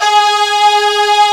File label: G#2 viola; AKAI S6000 CD-ROM - Volume 1; Strings